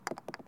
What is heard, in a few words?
BALL IN HOLE; pat & mat game; MINIGAMES; MINIGOLF